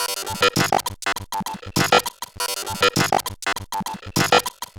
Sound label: Bent RX17 (100BPM), Circuit Bent; glitch